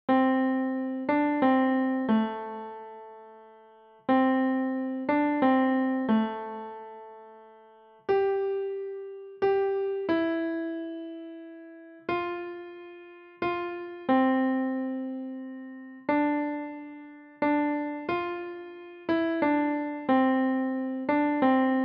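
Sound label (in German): Klavier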